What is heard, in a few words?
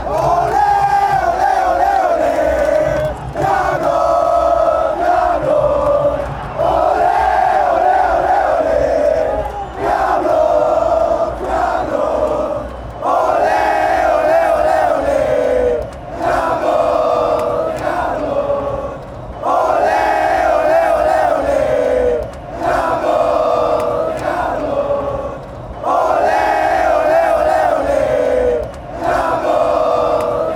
ole ole ole, chants; diablos